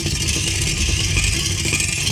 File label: tire damaged, vehicles